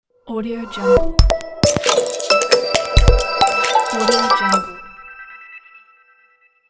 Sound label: glitchy tech logo